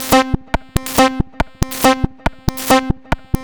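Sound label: rhythm loops, 70 bpm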